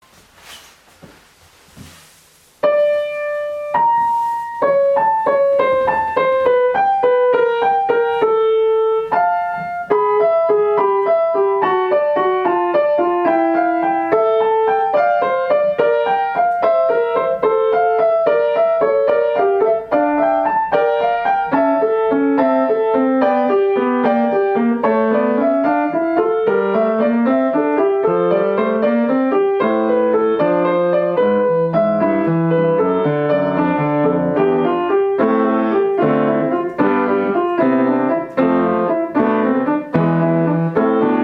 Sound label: gigue; mattheson johann